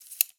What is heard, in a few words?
side menu slide out, ui; Counter Strike Model Pack; CS:GO; panorama